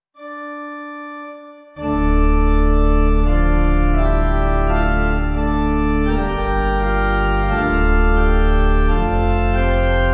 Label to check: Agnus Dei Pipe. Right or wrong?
Right